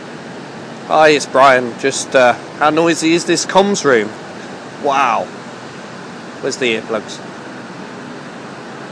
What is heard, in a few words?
noisy comms room